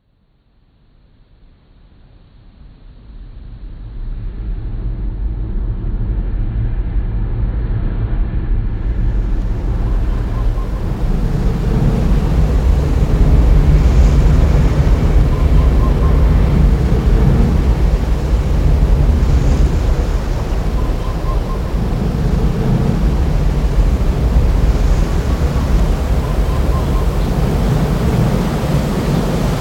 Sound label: sliding waves